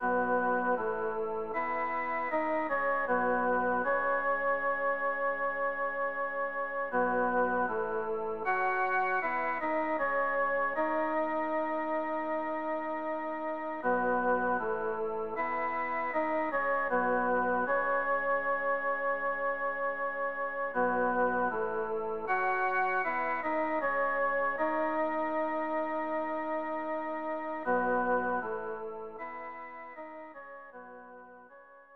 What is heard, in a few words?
Conversation